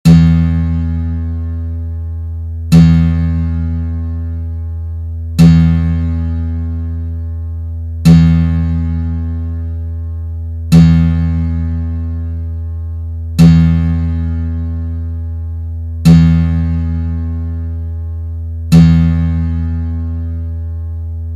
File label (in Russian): struna Zvuki strun akusticheskoj gitary